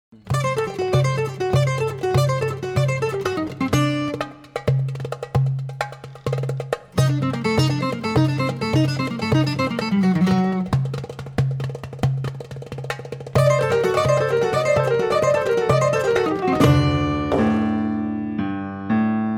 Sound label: Drum On Drum On